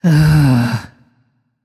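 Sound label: sigh, female voice; smite; voicepack; hera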